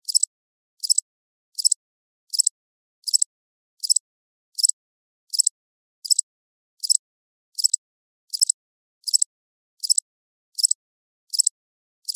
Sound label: Cricket Chirps, Ambience, Night, Insect; Animal, Cricket; Bug; BlastWave